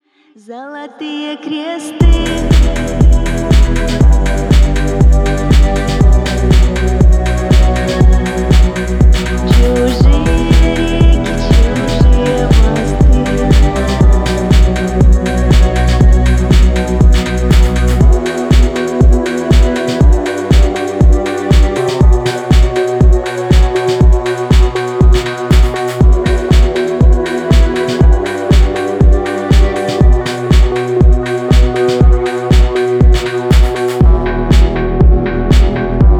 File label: Yugoslav groove